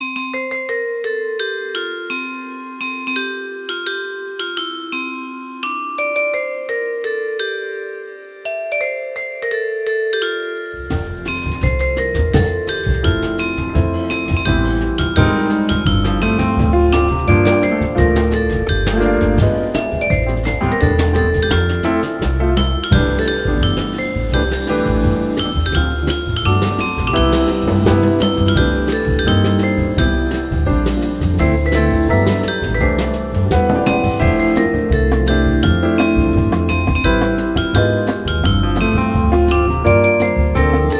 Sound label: christmas smooth jazz instrumental